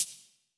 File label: hihat; Electro; futurefunk